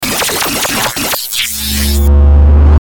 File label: Stinger Hits Electrical Buzz; Production Element Imaging Element Accent Transition